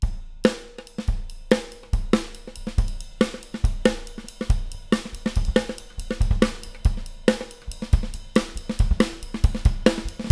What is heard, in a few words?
High Snare Loop, acoustic studio drums